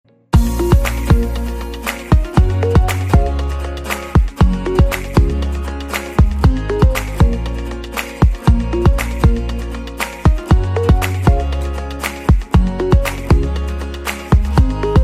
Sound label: amazing rythem ringtone